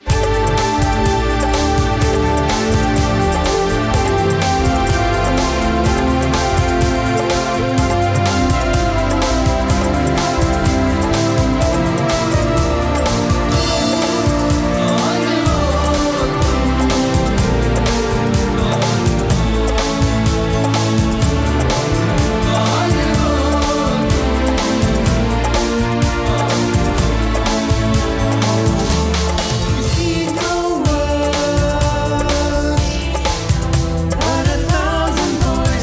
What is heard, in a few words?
One String